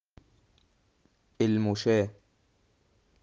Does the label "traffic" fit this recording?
Yes